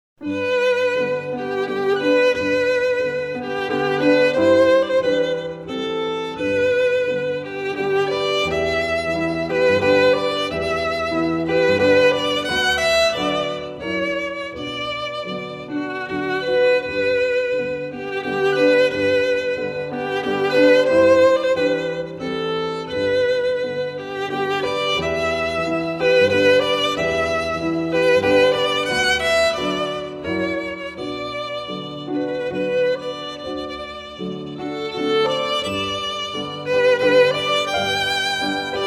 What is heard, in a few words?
Waltz